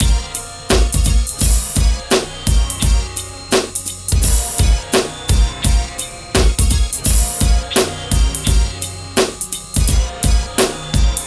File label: MIX, Hip Hop and R&B Drumloops